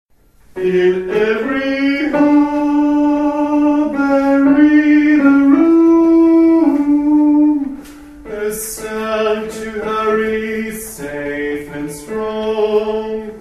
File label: Tenors